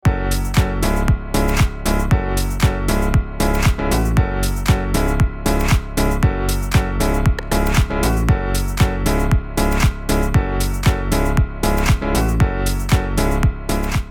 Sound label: powerful rhythmic uplifting intro